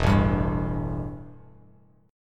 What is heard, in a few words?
E Minor Double Flat 5th E, Bass Standard; strummed; JGuitar